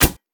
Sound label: Whistler Attack Spear Hit